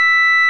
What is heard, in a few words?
FARF COMBO, Electric Organs; E-MU Formula 4000 Series Vol. 3 – Analog Odyssey